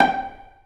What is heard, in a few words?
Pizz G4; Mo' Phatt; Digital Sound Factory Mo' Phatt